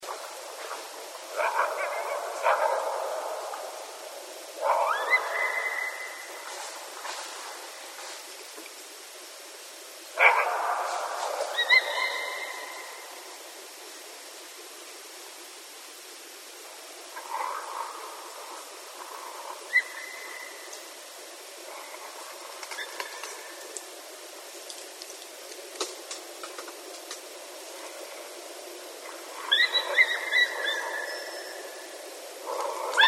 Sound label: Coyotes; Canis latrans